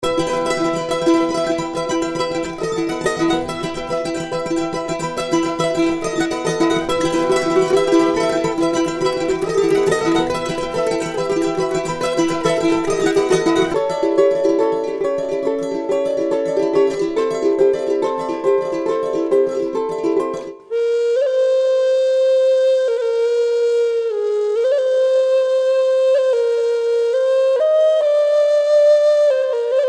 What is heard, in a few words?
World Instruments